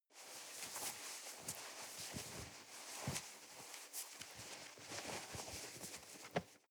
nylon leather very soft

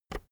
keyboard external enter push soft

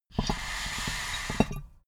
water pipe puff short

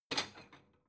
plate small take hard

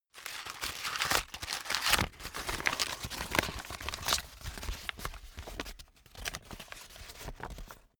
sheet flatten

